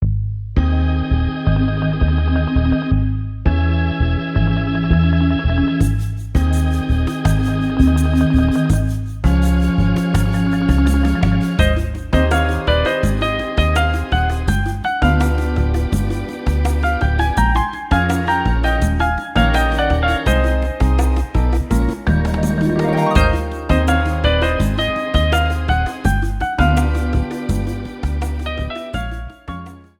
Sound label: Tropical Rhythms; Princess Peach Showtime!